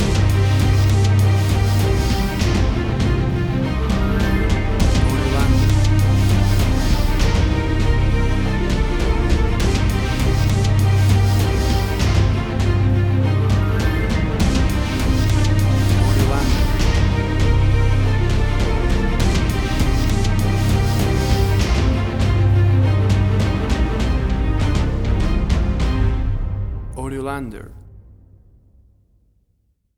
Big Powerful Action Movie Score

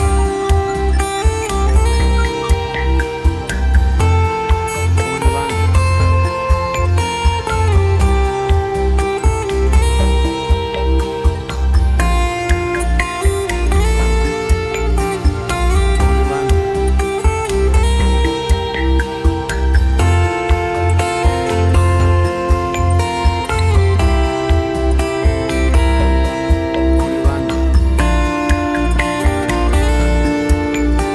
Acoustic Guitar Mood Music

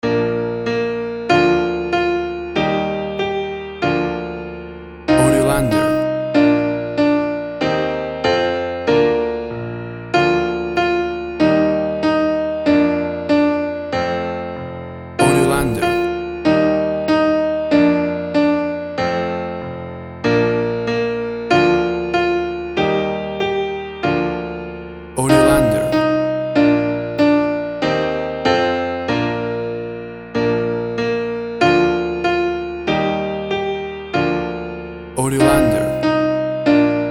Twinkle Twinkle Little Star Classical Piano; classical piano version

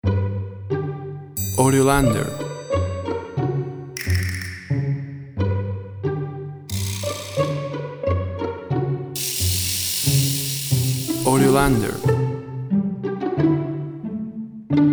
Silly Pizzi

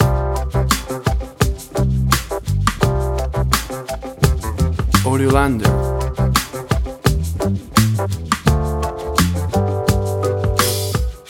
Easy Hip Hop Loop; A soft groovy track, made of cool electric piano, warm bass, deep drums, percu

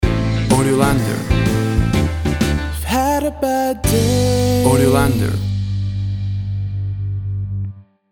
Youve Had a Bad Day Short Stinger Mix

